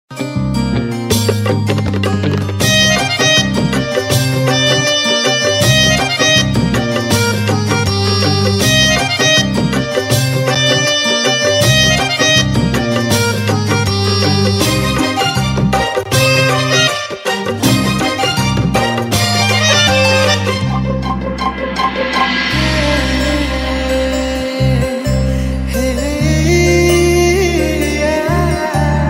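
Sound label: background Tune; Tv Serials; Sony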